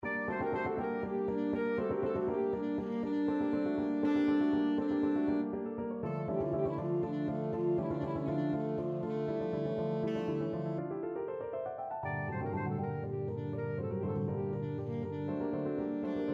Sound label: scherzo midsummer, saxophone